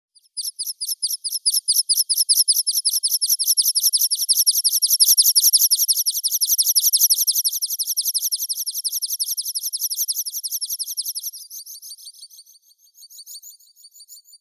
konek gornii anthus spinoletta

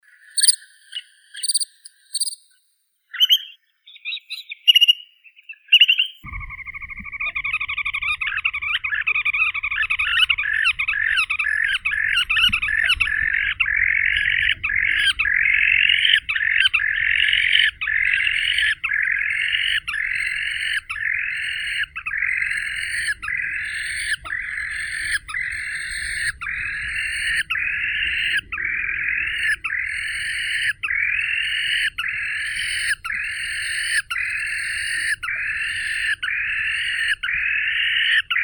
morodunka xenus cinereus

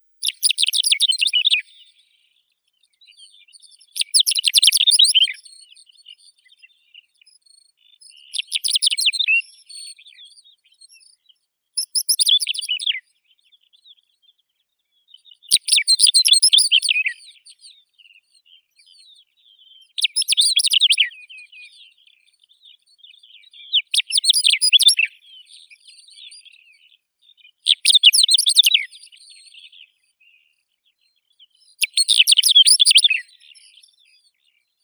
zhavoronok malii calandrella brachydactyla, zvuki prirodi

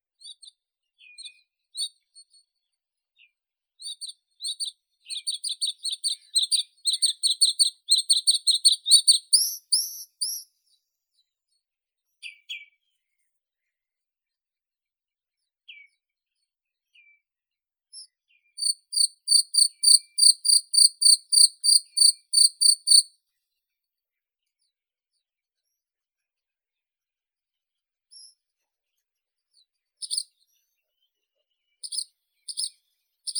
varakushka luscinia svecica, zvuki prirodi

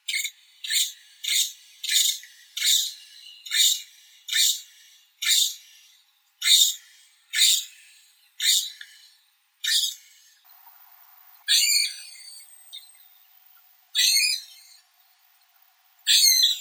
zvuki prirodi sonya polchok glis glis

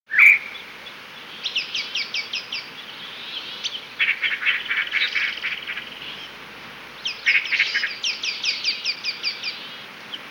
ptits nespeshnii razgovor, zvuki prirodi

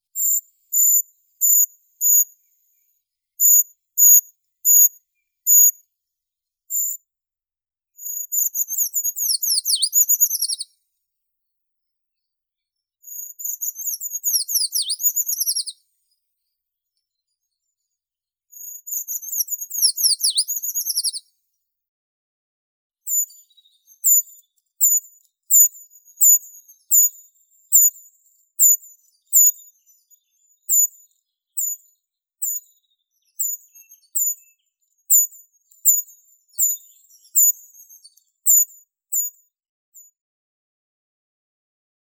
pishuha obiknovennaya certhia familiaris; zvuki prirodi

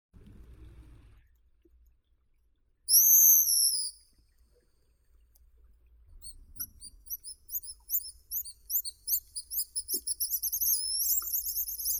chistik tihookeanskii cepphus columba

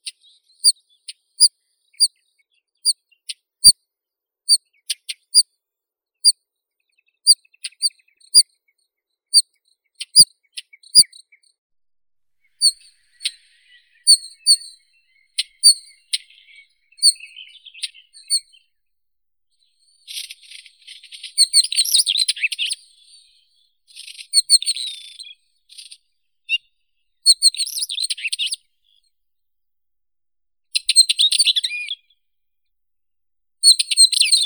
kamenka obiknovennaya oenanthe oenanthe

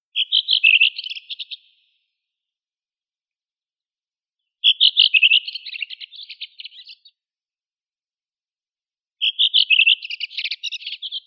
drozd belobrovik turdus iliacus

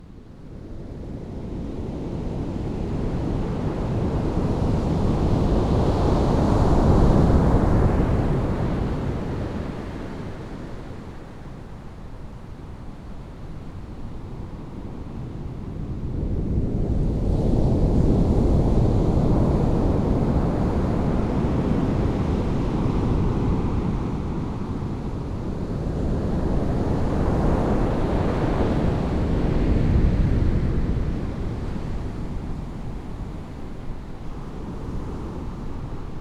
rokot voln morskih, zvuki prirodi

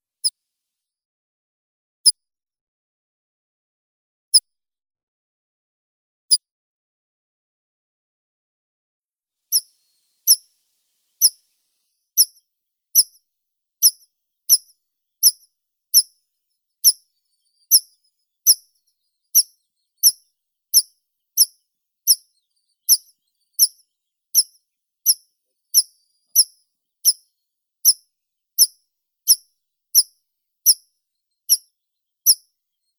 konek lesnoi anthus trivialis

